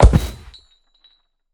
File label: Minecraft ravager step